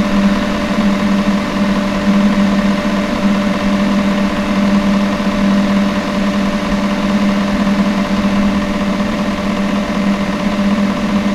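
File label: Fan rotation, Stove Air Blower; Stove #3 Air Blower; ARDI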